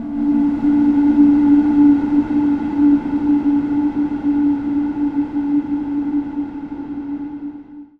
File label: Steampipe Ghostblow G 2